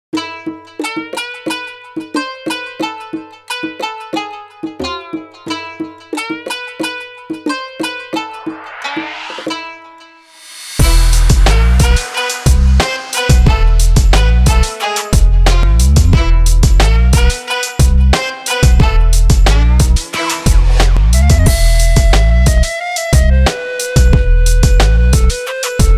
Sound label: kpop instrumental